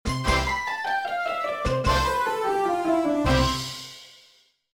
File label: WIN; TSG TLOC